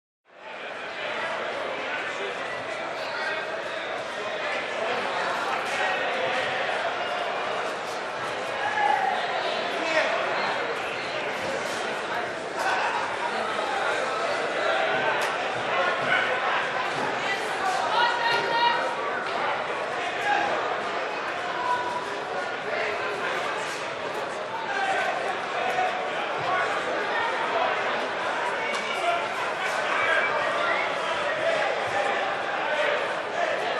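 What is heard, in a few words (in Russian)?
звуки фондовой биржи крики разговоры общий шум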